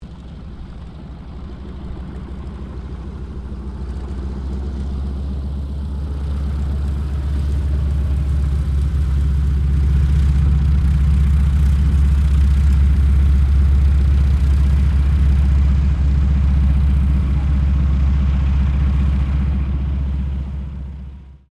AIRCRAFT AT-6D HARVARD MK III TAXI; Temora Harvard MK III; Airplanes; Vehicles; Airplane Sound Effects

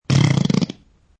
Slow Fart; Farting sound; Fart (Sound Effects:Human)

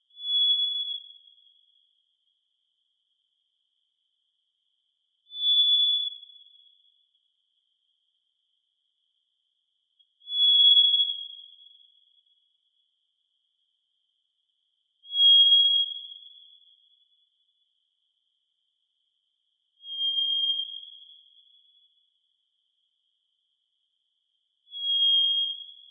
Bird Whistle Bird Sequence, Australian birds; Animals; SoundEffects-Foundation1

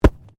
Body movement Organic Impact Medium

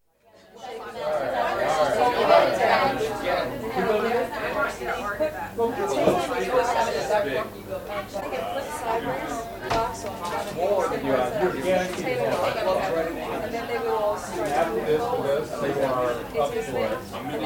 New Orleans: Soul Food restaurant atmosphere; Restaurant Sound Effects; America; BBC

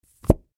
Suction Cup Up; foley; Suction Sound Effects; Objects; Blastwave

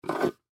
Tin Container, Tin Pick Up Table Fast; Pick Ups; Objects; Blastwave; Foley

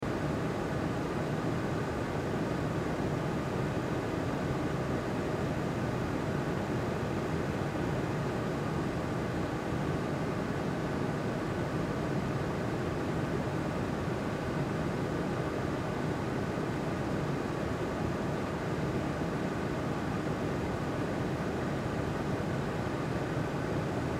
Air Conditioner Vent Loop; Transportation Automobile; Car Sound Effects; Blastwave

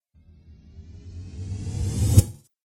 Dance Riser

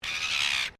Bird Silver Gull Agressive Call, Australian birds

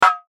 Error Message; Electrical Button Sound Effects

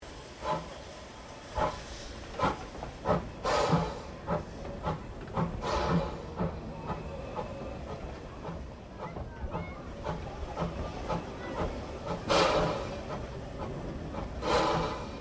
Train NA Class Pass Crossing, Steam train; PUFFING BILLY 1908; Melbournes classic Puffing Billy Steam train; Trains

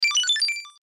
Video Game Arpeggio Ascend High; Video Games; Blastwave

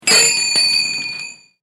Bell Hotel Desk; Bells (Sound Effects:Musical)

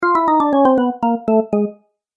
Organ Game Music; Cartoon Music Sound Effects

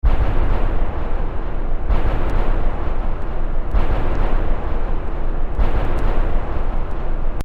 Train Rhythm, Train rhythm loop.; Trains; Vehicles

